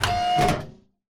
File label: cannon turn; netent